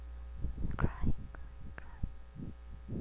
cry

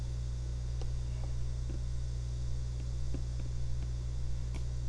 cluck

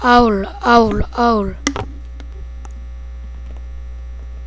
owl

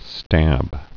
stab

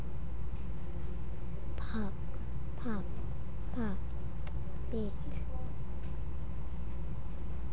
pop

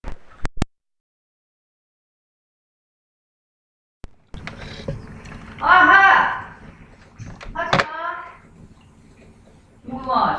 flush

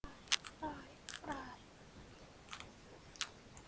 fry

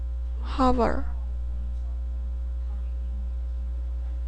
hover

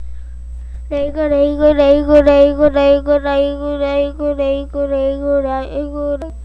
rake

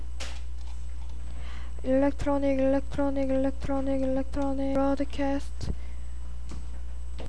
electronic

